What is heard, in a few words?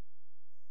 A#, FX; Big Fish Audio